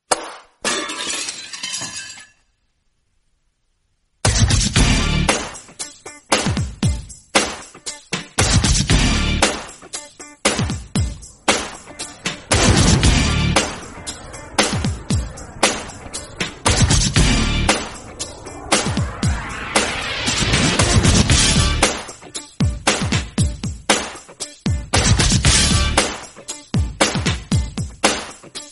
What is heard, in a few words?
hard bass; adidas